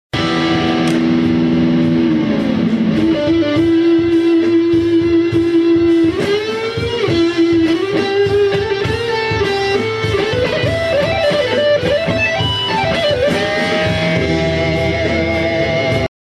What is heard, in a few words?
short guitar solo